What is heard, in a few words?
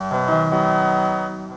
fanfare